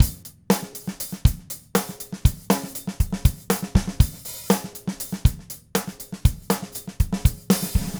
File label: Green Maple Groove 120 BPM, Vital Drums, DW Green Maple Kit; Sonic Foundry (Sony Creative Software)